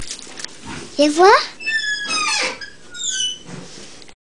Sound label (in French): Bulle